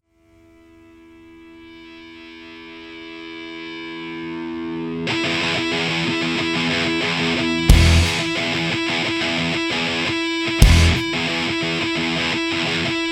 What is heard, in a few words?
Stuma (instrumental)